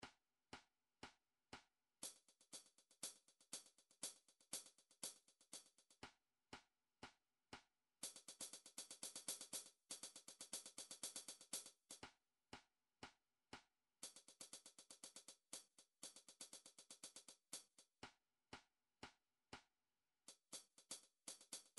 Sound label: drum trax hats